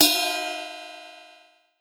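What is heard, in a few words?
Bell cymbal, Metallic Percussion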